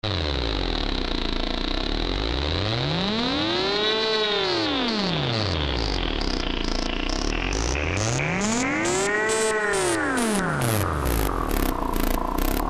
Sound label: e-phonic invader vsti sounds external fx